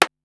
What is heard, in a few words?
Hammer, Korg Wavestation-SR; Wave 455 - Drum Kit